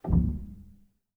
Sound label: rel, Grand Piano; bitKlavier Grand; Piano Bar